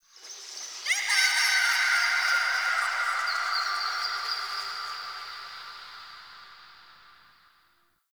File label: Zariman Park Memory Echoes, Ambience; Warframe; TheNewWar